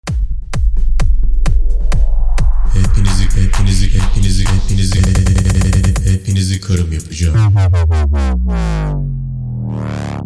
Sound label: rus trap